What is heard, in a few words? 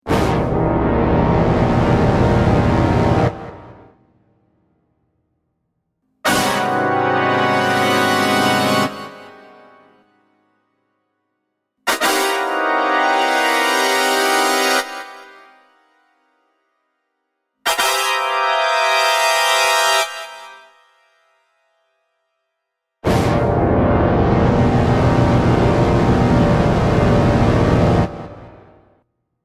Dramatic brass instrument swell stabs.; Miscellaneous Musical (Sound Effects:Musical)